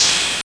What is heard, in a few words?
Ride; ChipShop